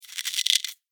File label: Insect Move Click, Insect, Movement, Clicks, Bug; Blastwave; Animals:Insects